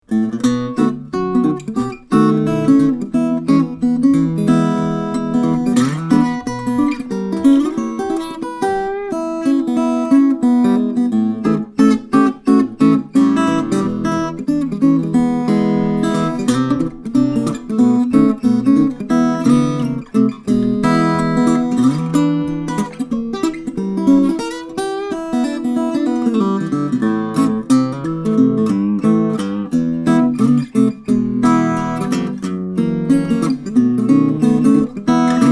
emerald x7 os artisan series black, steel string guitars, flattop